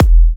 Kicks E; markus hakala

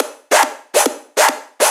Loops 140 BPM Hihat Percussion Loops

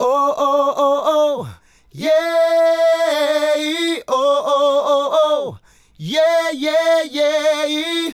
Male Group, Hip-Hop and RnB Vocals

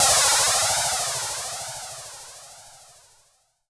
SPLASH, SF EFFECTS; Q Up Arts; Dream Experience

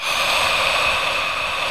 BOTTLES; OMI - Universe of Sounds

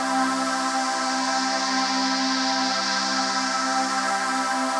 Pad Loop C, shimmer and sparkle

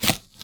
Bread Cut, household rhythm samples Hits

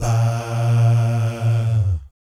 VIB FX, Jazz FX

